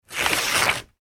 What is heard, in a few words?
paper sugar rip, Paper Ripping (Sugar Paper); Sheet Of Sugar Paper Ripping / Tearing; foley; Objects; McKinney Sound